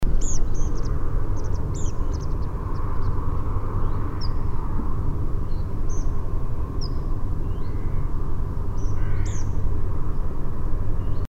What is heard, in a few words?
flight calls